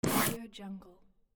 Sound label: foley item grab pickup